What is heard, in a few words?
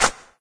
Frosting Cleared; Candy Crush Saga